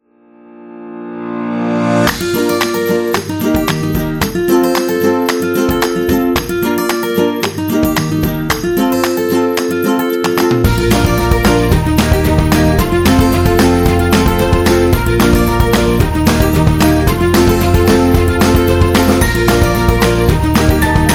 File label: Cheerful; birthday music